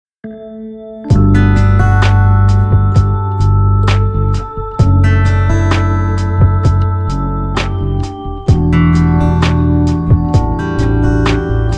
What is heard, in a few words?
Finger APX, guitars; musical-instruments; el-ac-guitars